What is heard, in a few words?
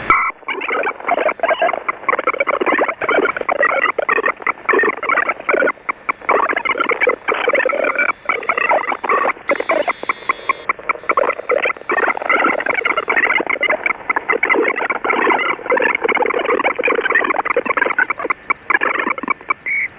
HC-265 UHF; HC-265 scrambler in UHF-mode (2x 240Bd FSK preamble); HAGELIN CRYPTOS (CRYPTO AG); VOCODER-systems